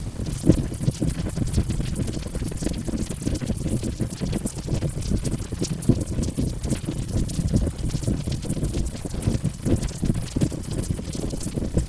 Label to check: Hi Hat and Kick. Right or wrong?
Wrong